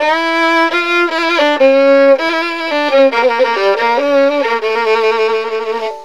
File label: VIOLIN; USB Soundscan vol.63; AKAI